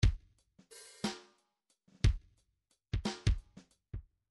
midi file grooves 3 4; Bdf Midi File Grooves

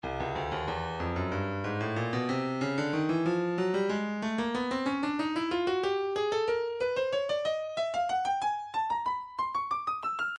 breakbeat 093 bpm; Stylus; R&bees

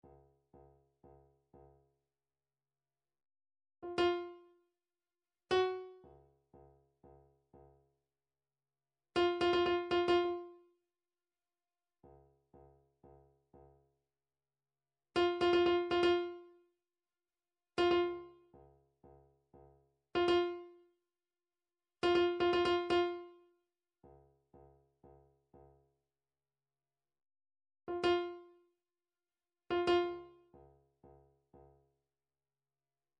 drum trax timb

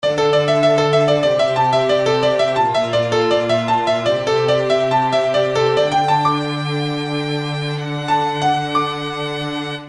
game over; snes gradius 3

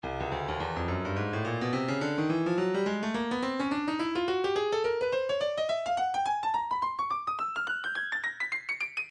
breakbeat 106 bpm; edifice b; Stylus Breakbeat Midi Files

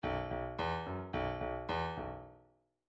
bdf midi file grooves rock d rock d

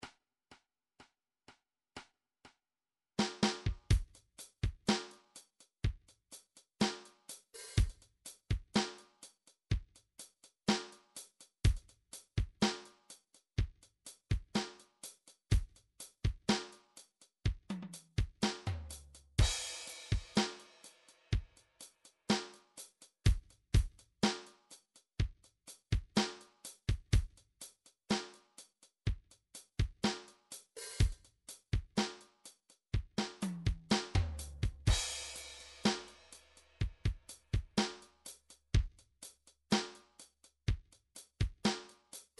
blues

